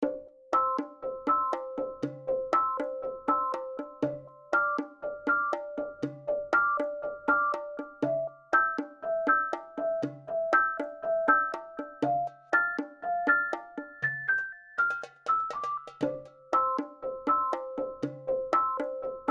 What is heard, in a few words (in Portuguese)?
pianinho